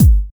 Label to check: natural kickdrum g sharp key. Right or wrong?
Right